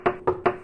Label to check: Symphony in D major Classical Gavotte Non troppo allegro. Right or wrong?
Wrong